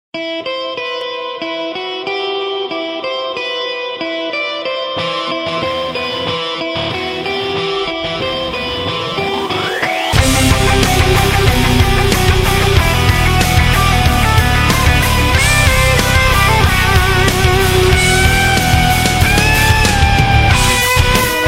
Solo Guitar

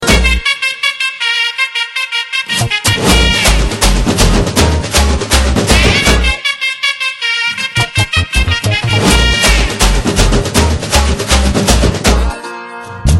Kuthu

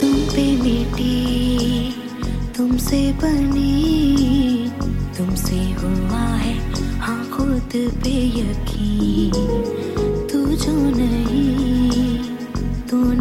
Female Duet

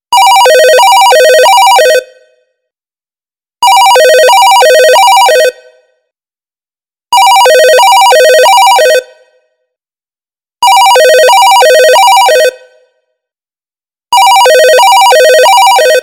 Vintage phone

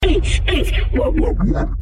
Dubstep

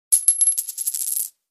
coin

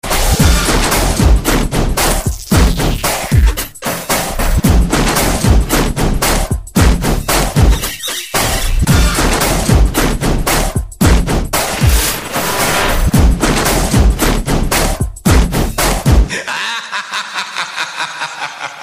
Army Beat

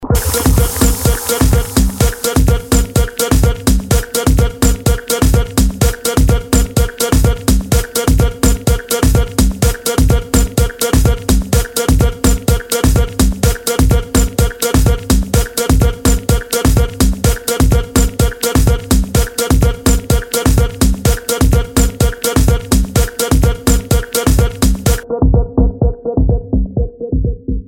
tuba

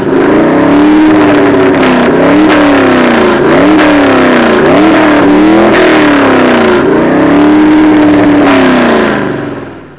Drag Car

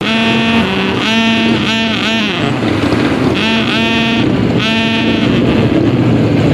BIKER; BLACK WIDOWS

